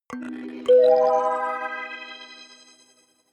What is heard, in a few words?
notification kalimba magical octave up